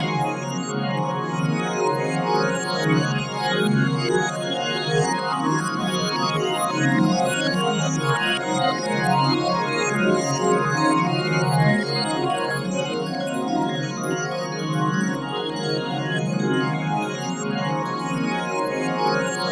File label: STAR SPIN, Pads; Distored Reality 1&2